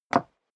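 step leather shoes wood parquet single stair up, footsteps; schritte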